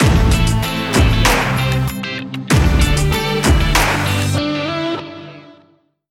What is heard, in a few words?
lavish lifestyle stinger